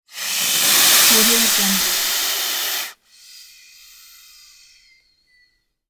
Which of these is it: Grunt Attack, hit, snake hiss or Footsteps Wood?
snake hiss